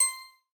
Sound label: counter; spinsane